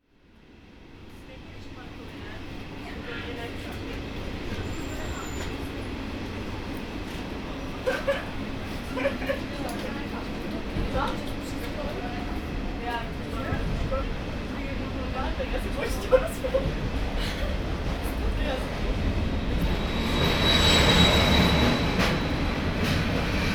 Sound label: Train From Opava, field; Hradec Nad Moravici